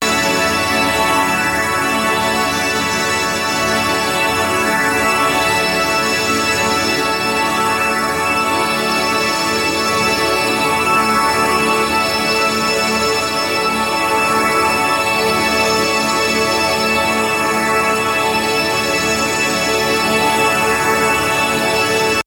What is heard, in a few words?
Organ